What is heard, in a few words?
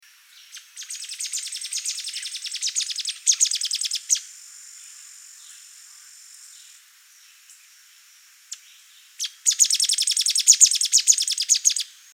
stripe headed sparrow